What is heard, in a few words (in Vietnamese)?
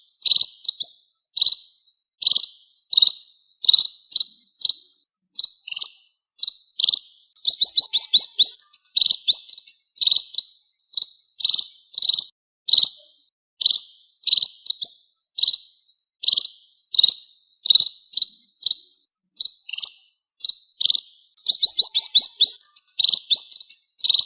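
tiếng chim ri đen gọi bầy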